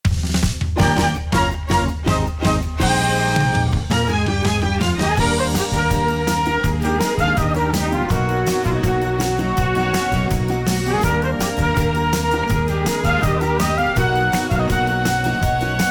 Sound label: Lounge Pop Arrangement